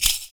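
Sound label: PERC, percussions; Univers Sons - Raricussions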